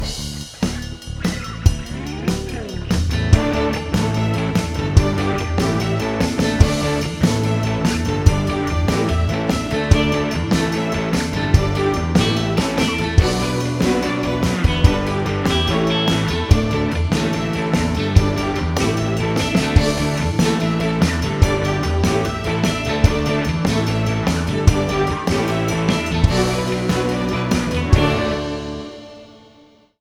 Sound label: Positive Strings